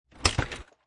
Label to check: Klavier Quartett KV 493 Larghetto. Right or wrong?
Wrong